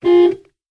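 vehicles car horn beep single interior toyota rav4; zapsplat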